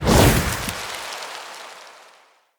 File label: Fey Ward Flock Cast Release; Powers